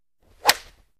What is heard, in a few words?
Golf Tee Off